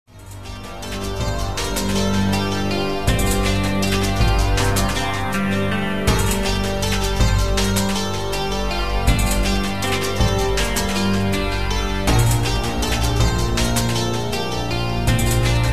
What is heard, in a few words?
Music